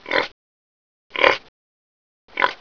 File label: pig; jclic